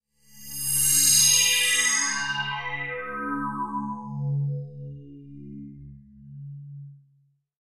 Wash Metallic Clang W

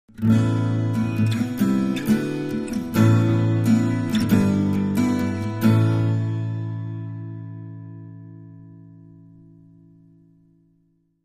Acoustic Guitar Ballad

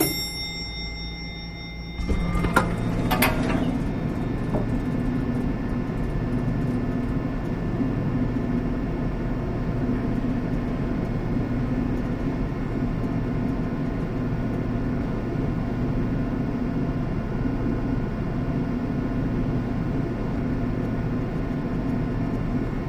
Elevator Bell Ding

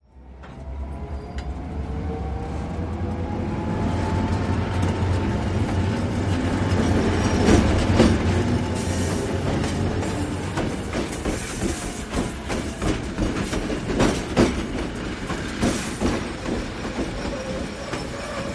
Train Wheels Squeak, diesel

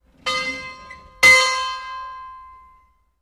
Small New England, bell towers

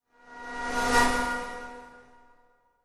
Static Buzz By Thin, passing elements

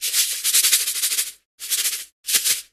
Salt Shaker, cooking

